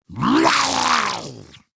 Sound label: Spawn Grineer Ghoul; Grineer Ghoul Expired; Battle Barks